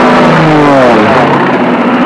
INCOMING, Aerei; trasporti

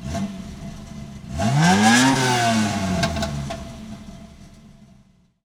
IDLE, auto; trasporti

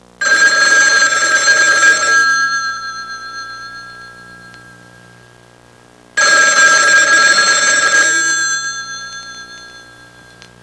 TELEPHN; phone

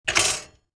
toaster; casa

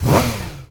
CAR START; trasporti; auto

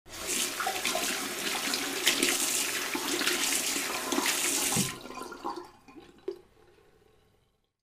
washing hands; casa

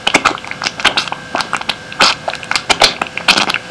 BUBBLING, casa

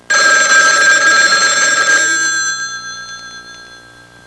PHONE RI

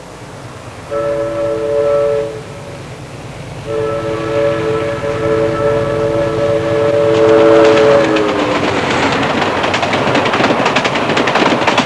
CHOO-CHO, treni; trasporti

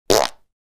fart; rutti e scoregge

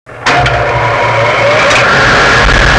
furnace, casa

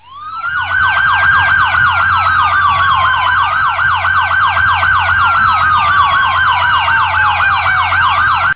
Police Siren; trasporti; soccorso; Sound Effect

